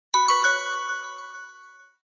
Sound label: message, notifications; Coolpad; 5010